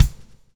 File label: lash, backbeat